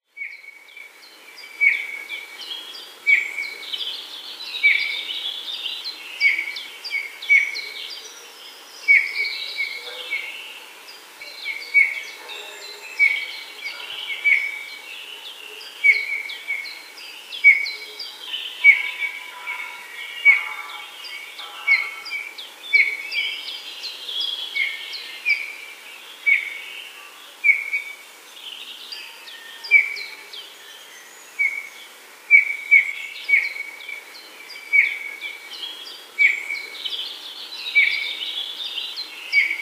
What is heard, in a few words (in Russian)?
Golosa ptic Snegir Penie; Nikolay Mazepa